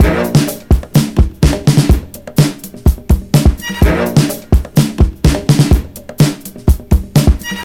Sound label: 126 bpm drum beat b key, Free drum loop - kick tuned to the B note. Loudest frequency: 1161Hz